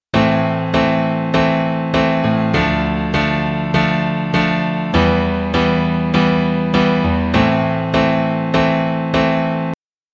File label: a minor chord